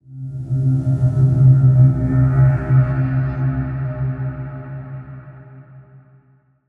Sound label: Metallic FX - Cm 70Bpm; Construction Kits; STEMS; Ghosthack; SkyRealm; Kit 19 - Ode To Daenerys